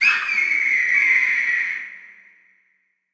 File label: UI Prop Eagle